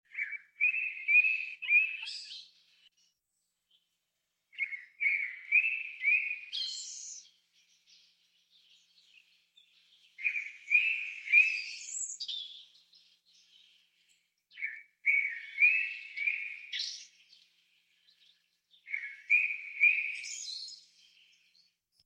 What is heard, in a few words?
spring forest sound